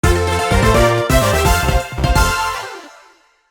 Minigame Victory

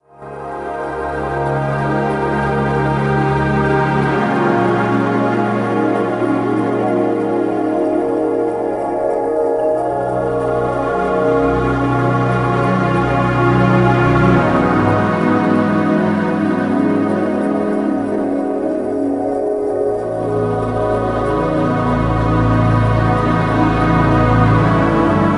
Game Over; Ecco the Dolphin Defender of the Future; Dreamcast

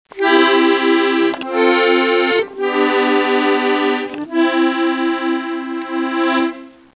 Accordion; kentei kyoukai imasara hito ni wa kikenai otona no joushiki ryoku training ds

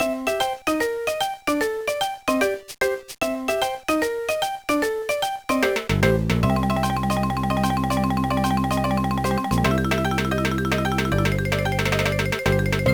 BGM; animal mania dokidoki aishou check gba

